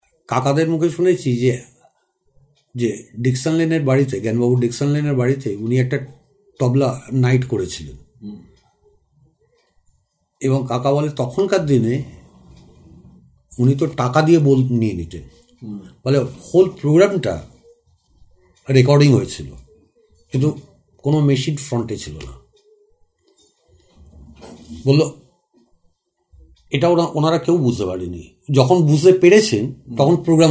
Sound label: recording tabla; tabla Maetros